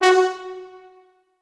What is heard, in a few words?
F#3 R, Orchestral Brass; Best Service ProSamples vol.20; AKAI